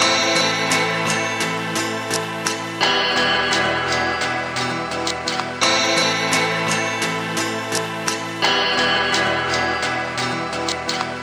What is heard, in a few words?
HipHop Synth Guitar A min; Digidesign; Pro Tools Essential Loops